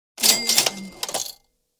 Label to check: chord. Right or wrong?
Wrong